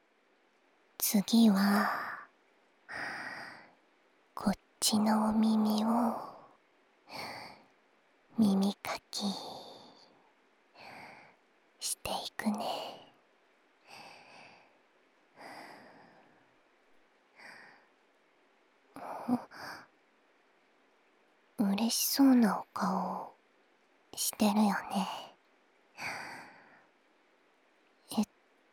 ASMR 膝枕で耳かき（左耳）

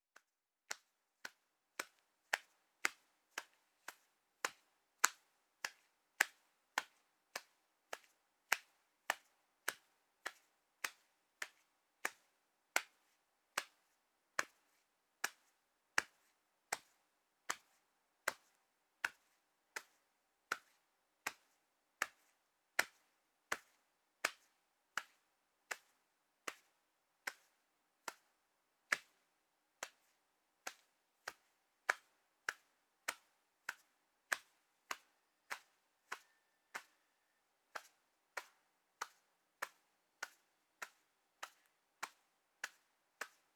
肩叩き

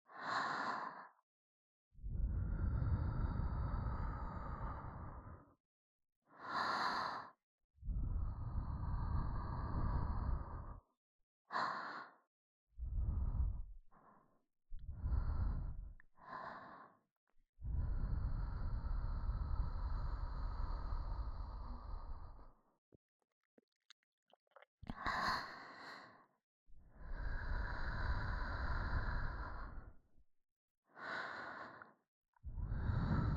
ふー…ふー…, ASMR

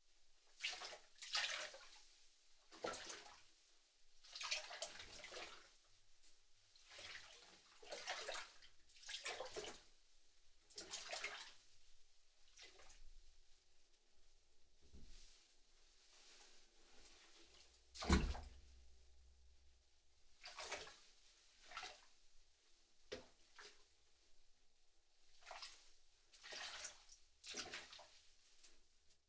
泡風呂 の ちゃぷちゃぷ; 3DIO; お風呂に関する音

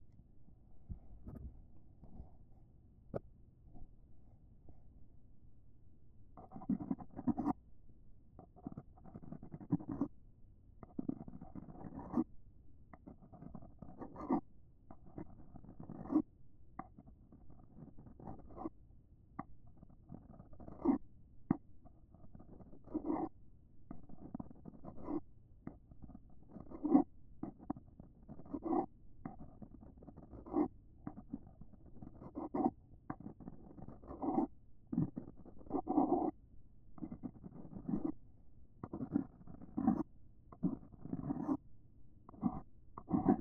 ハード耳かき 左

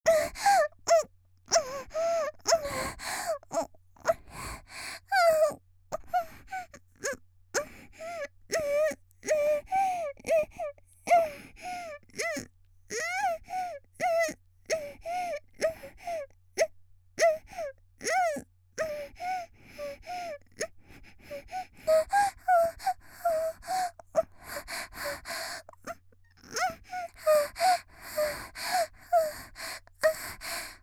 「喘ぎ」声を潜める, 少女; 元気な少女

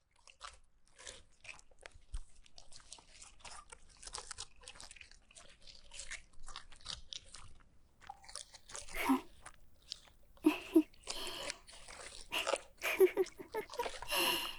泥エステ, ASMR; ななの。泥エステ。

